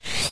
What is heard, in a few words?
science maximize, windows 9x sound schemes